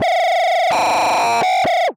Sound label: Digital Faliure; SFX